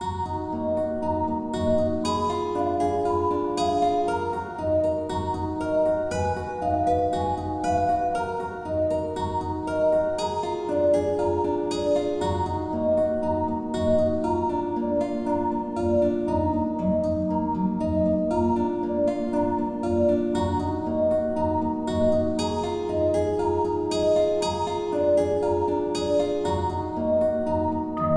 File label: Guitar